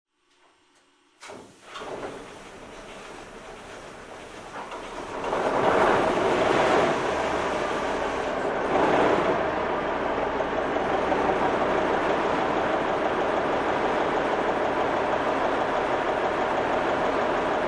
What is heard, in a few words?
Military Truck engine in hangar